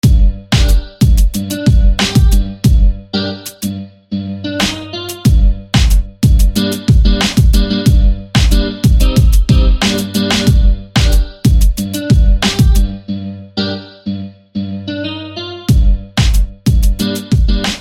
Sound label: Jazz Chor Guitar; SFB FX